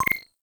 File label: UI PM Received